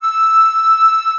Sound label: flute